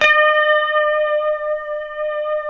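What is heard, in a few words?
E 5 harmonic, guitar; Spectrasonics; Hans Zimmer Guitars VOL-1; 90's Sample CDs; HYPEMONICS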